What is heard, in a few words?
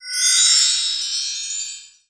Sound magic